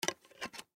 Cd Rack Put Cd On, Cd Rack Put Cd On Spool; Plastic Movement; Plastic Sound Effects; Materials; Foley

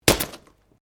Branches Dead Snap

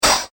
Hologram Laser Gun

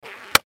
Rubber Glove Stretch Snap; Miscellaneous Office Sound Effects; Foley; Blastwave

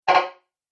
Message Error, beep

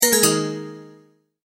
multimedia tone end

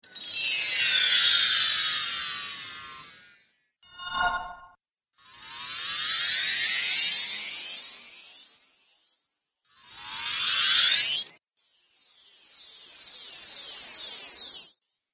Christmas Spells, magic; Christmas Spells - Collection 03; Magic Sound Effects

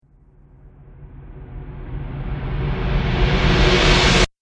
Cinematic Riser Abrupt Stop

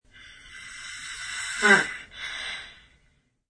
Snore

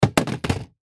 Toolbox Drop Plastic Empty; Hand Tools; INDUSTRY; Blastwave

